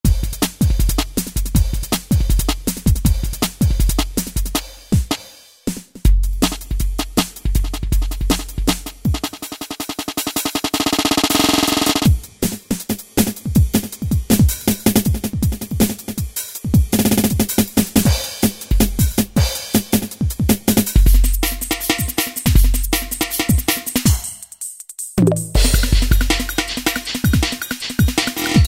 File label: Drum 'n' Bass patterns, Boss DR-770; Preset Patterns